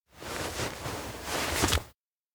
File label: friskbee take out; anemoi